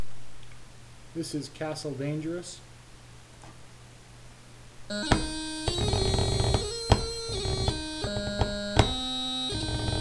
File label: Drums; Castle Dangerous